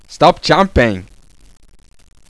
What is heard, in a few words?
disturb; svencoop